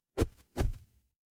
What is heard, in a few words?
paper fabric cloth whip